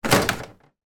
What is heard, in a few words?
household television flat screen bash into; sound effects one